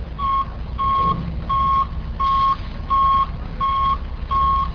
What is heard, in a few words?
backup beep